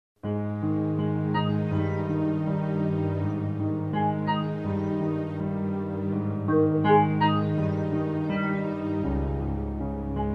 Ambient

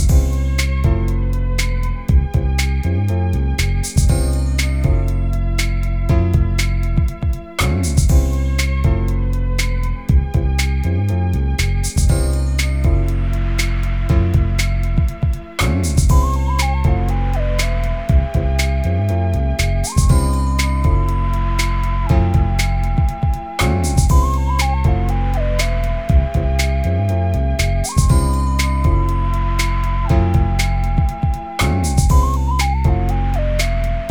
Corporate

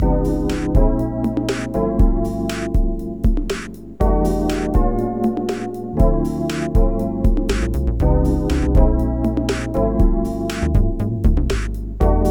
Laidback Jazz

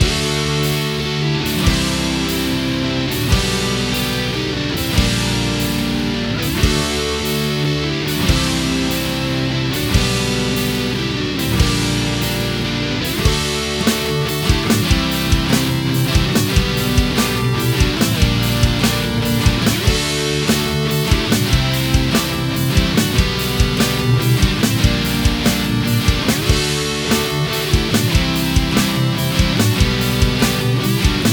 Rock

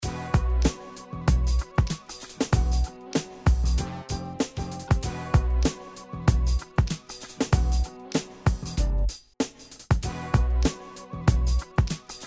Hiphop

